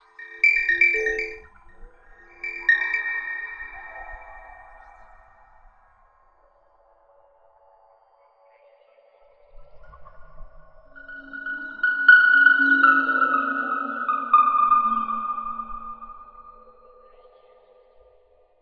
Sign Bell, x2, Sharp, Doppler, Jingle, Bouncy, Sci-Fi; Universal SFX